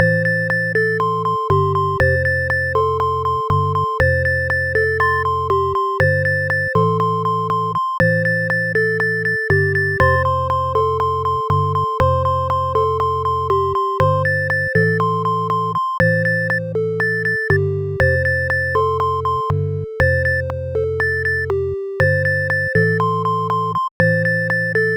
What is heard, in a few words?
Sine Wave